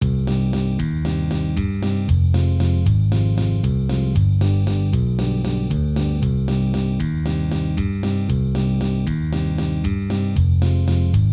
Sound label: Rumba